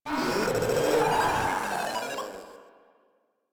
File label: Proto Infested vocal attack